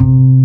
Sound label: HARP HARM C3